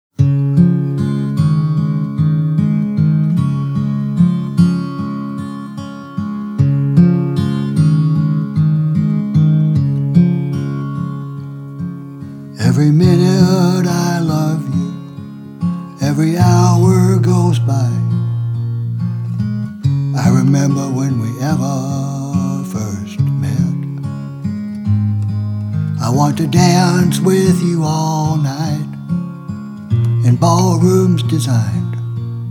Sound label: Waltz